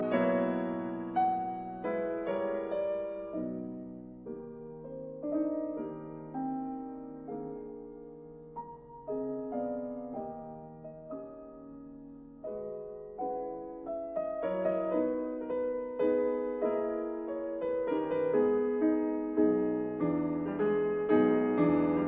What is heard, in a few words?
Mazurkas in G sharp minor; 10 Mazurkas Op 3 No 9